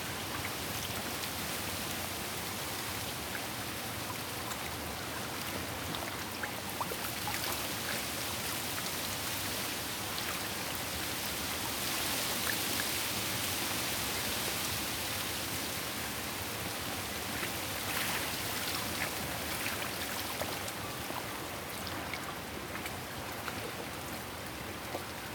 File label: lake bank; firefight